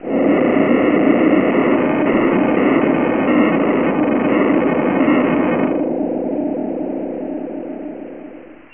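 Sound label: crash land; ff6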